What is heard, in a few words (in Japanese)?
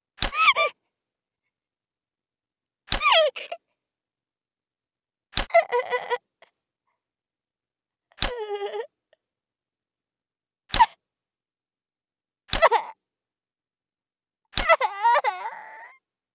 ひたいに刃物を何度も当てる, 効果音, ASMR; 効果音あり